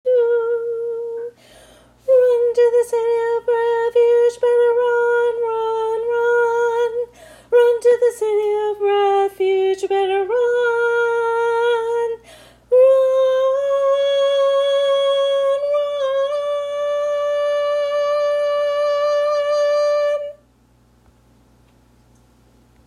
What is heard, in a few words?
tag tenor